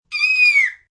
Scream Squeal, Scream, child, girl, short, swooping down, bird-like, fear, terror; human voice; Screaming; VoiceBox